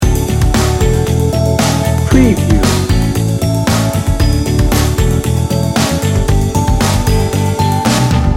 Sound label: daytime talk loop